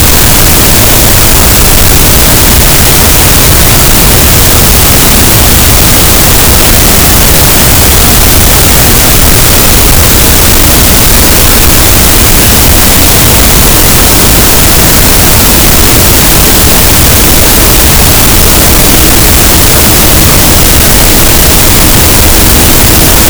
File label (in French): cachalot, gaussian noise; Physeter Macrocephalus